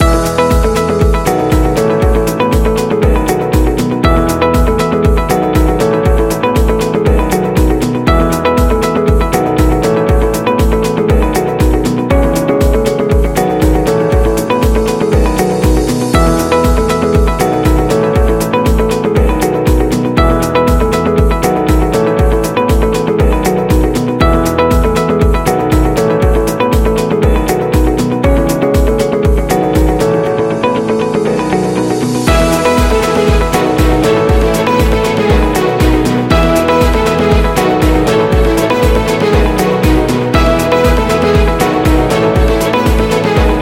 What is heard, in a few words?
Corporate Loop Positive